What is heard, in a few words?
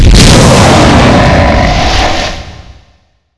m3dragonex dragon; zmall; zm boost; privilege; mboss